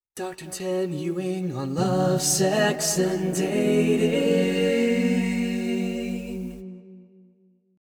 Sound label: jingle